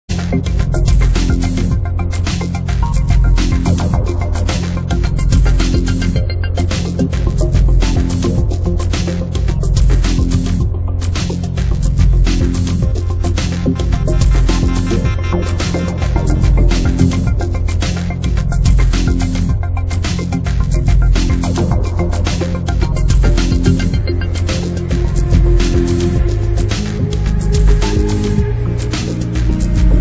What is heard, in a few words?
minimal; Last Ninja 2